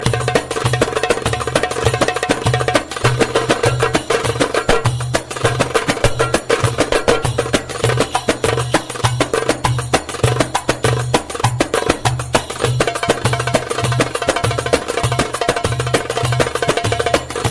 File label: arabic drum